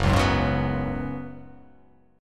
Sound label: F sharp Suspended 2nd Suspended 4th